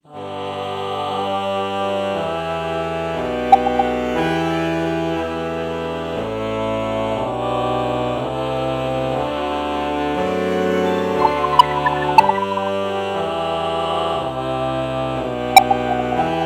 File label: alto saxophone ah morph